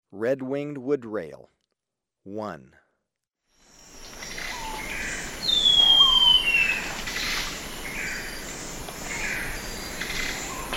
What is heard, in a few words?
Red-winged Wood-Rail; S. America